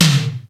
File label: Tom M, Percussion; Akai XR-10; Drum Machines